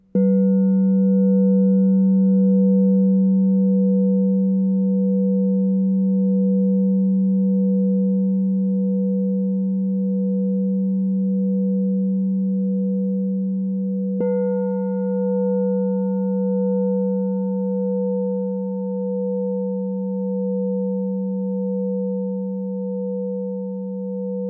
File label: 9inch LOW G